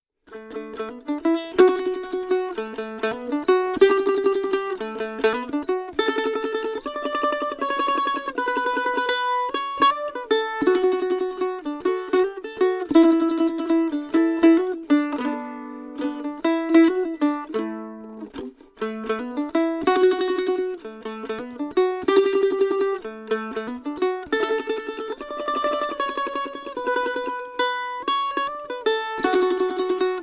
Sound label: mandolin Waltz